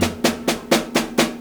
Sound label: DRMROLL; E-Lab; X Static Goldmine 2; akai